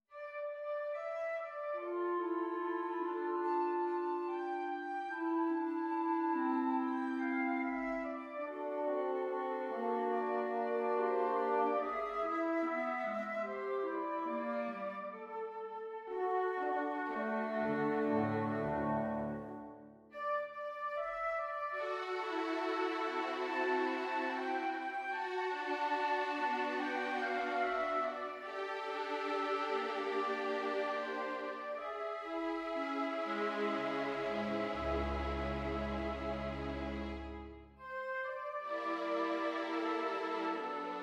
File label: A Sad Bird